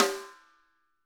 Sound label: SD, Drums; Bob Clearmountain Drums I